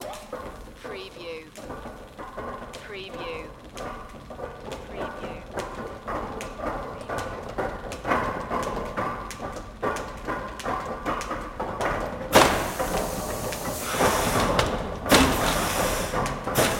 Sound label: Press Type